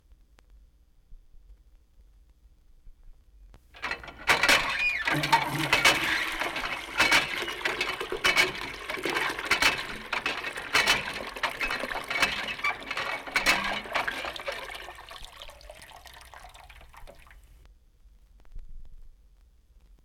Squeaky Water Pump; Jac Holzman; Authentic Sound Effects Volume 2

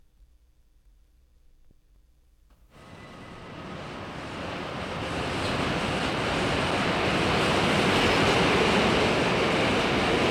Subway Sequence Exterior; Jac Holzman; Authentic Sound Effects Volume 2

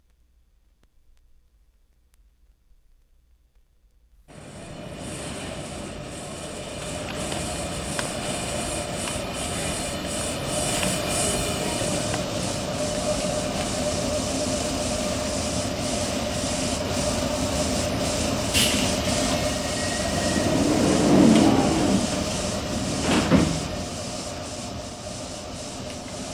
Subway Sequence Interior; Jac Holzman; Authentic Sound Effects Volume 2

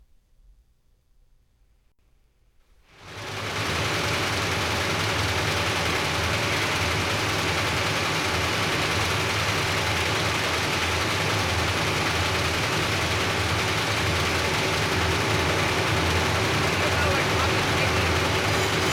News Presses High Speed; Jac Holzman; Authentic Sound Effects Volume 2

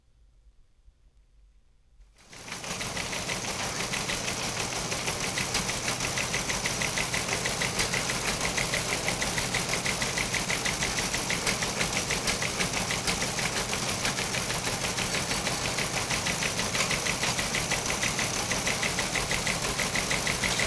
Teletypes; Jac Holzman; Authentic Sound Effects Volume 2

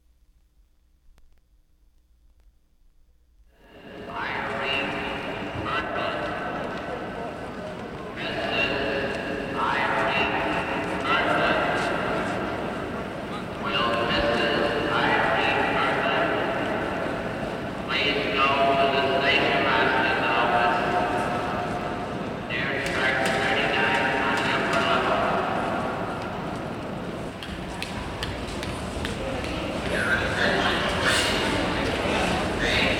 Grand Central Station; Jac Holzman; Authentic Sound Effects Volume 2

